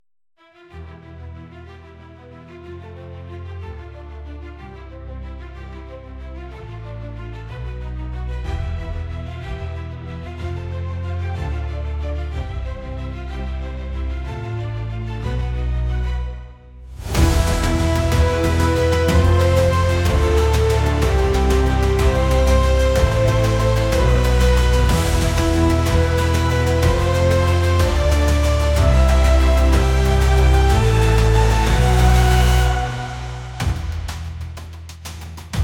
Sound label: calm